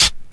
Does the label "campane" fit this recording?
No